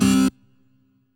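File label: Rave Synth, Dance Mega Jungle Rave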